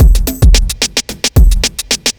block rock kit

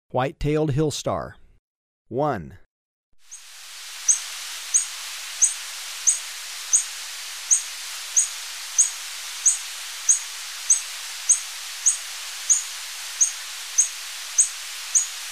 White-tailed Hillstar; S. America

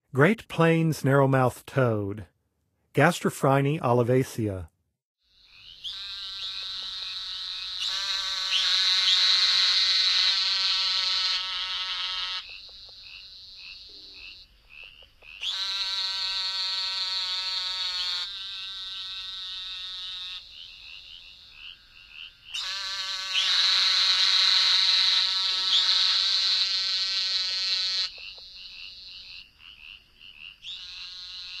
Great Plains Narrowmouth Toad; North America; Songs of Frogs & Toads of North America

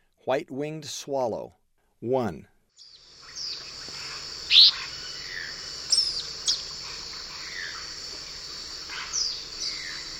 White-winged Swallow; S. America; Eastern Lowlands

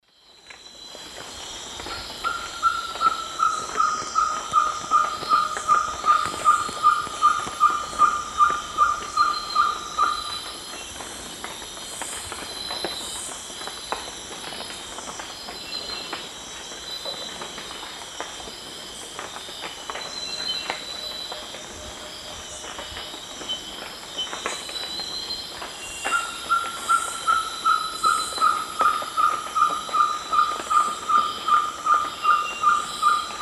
Glaucidium brasilianum, song; S. America; Eastern Lowlands

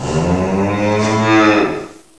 COW

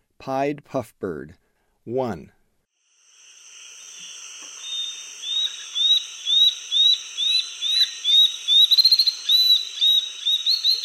Pied Puffbird; S. America; Eastern Lowlands

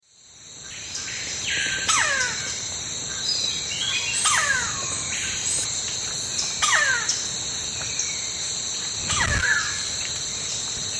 Campephilus rubricollis, call; S. America; Eastern Lowlands

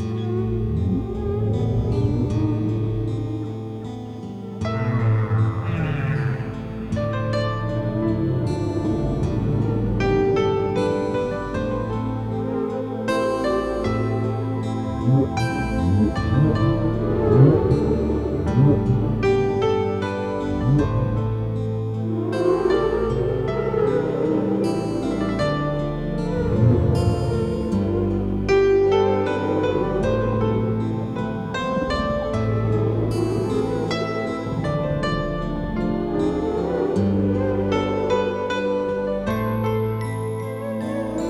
Symphony Of Whales; Nature Sounds - With Music

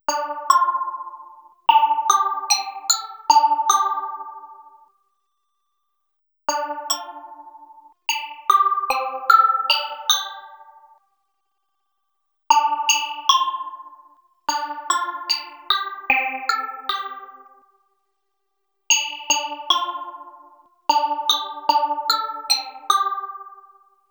Synth, Rock

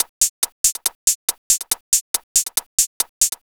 ACID TECHNO

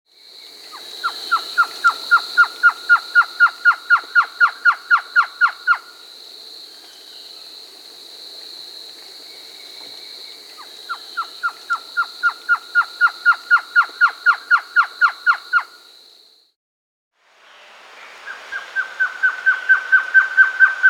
Blue-crowned Trogon (Trogon curucui), Peru; S. America

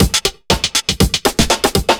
chemical 120, loops, 120bpm

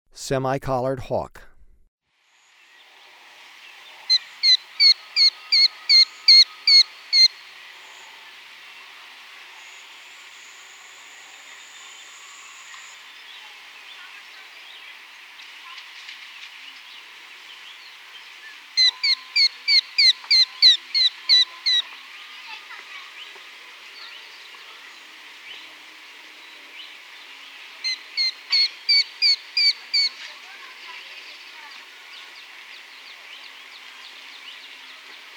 Semicollared Hawk; S. America

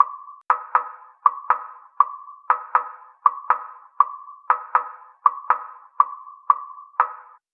Fibre Beat; Electronica; Solo Beats

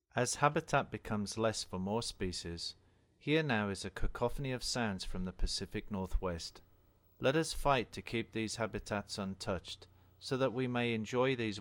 Chorus, Songs of Birds of North America

